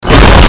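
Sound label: Distortion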